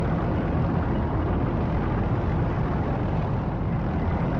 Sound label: tank Move, movement; Mindustry